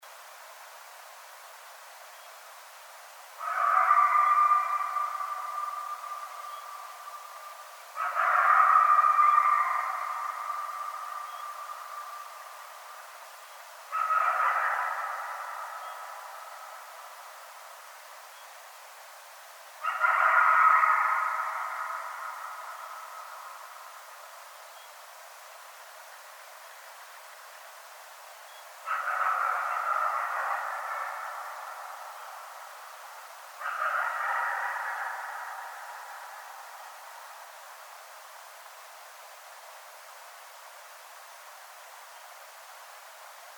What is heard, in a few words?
Coyotes; Coyotes (Canis latrans)